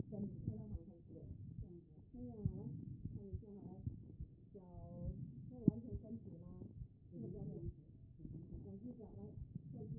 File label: HEART; B MODE